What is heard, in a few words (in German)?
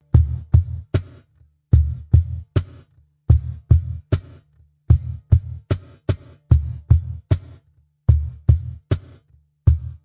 Rap Playback